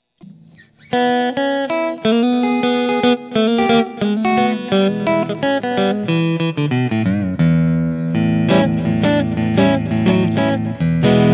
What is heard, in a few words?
70's 1959 STACK, Boss GT-8